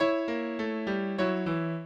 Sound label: minuet, mozart